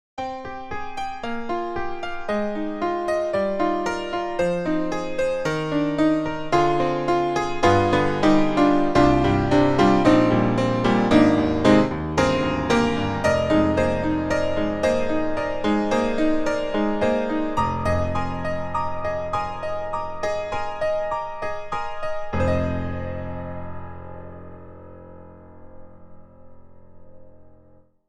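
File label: shiny Steinway, piano; IK Multimedia; Sonik Synth 2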